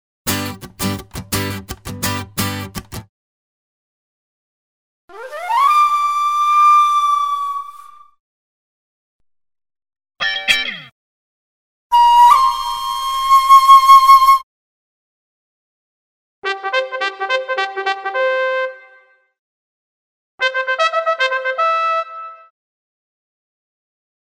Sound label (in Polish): gitata flet gitara flet trabka trabka bebenek pianino